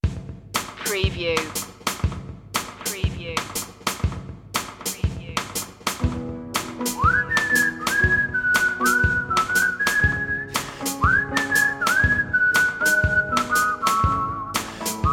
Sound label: whistling